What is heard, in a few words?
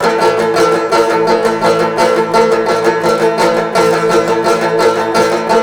banjo; zombat; danger